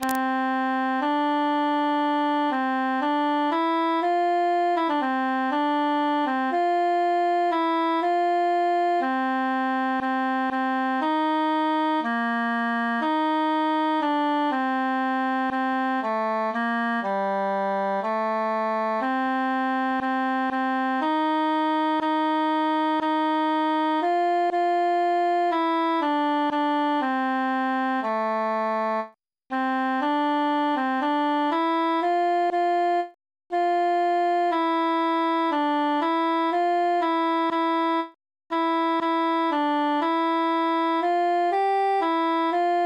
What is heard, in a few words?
altus solo